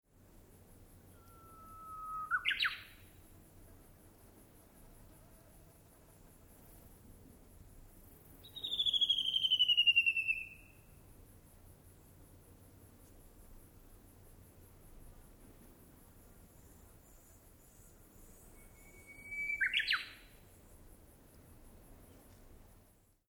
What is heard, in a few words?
bush warbler